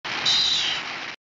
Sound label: giant serpent roar; king kong escapes